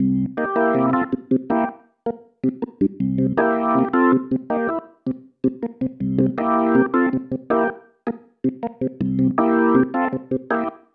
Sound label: Pattern, Organ